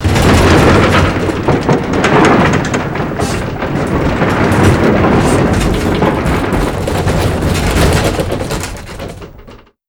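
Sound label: helicopter blackhawk stereo rattles; Explosions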